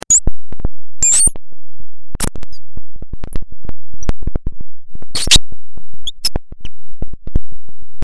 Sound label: fm7 patches